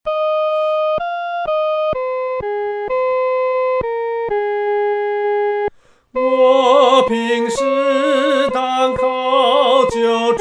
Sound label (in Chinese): 独唱 第一声; 信靠耶稣